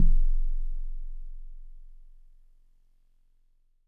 Warble Celeste DX100 F1

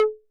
Conga Hi 808 Saturated B

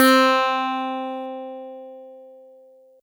Harpsi FM DX100 C4

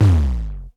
Sub37 Noise Tom Lo Vinyl Synths C3; vinyl perc

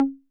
Conga Mid 808 Saturated B

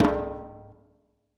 Djembe Hi Flam Verb

